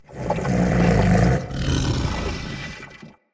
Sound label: Corrupted Battle Dog Snore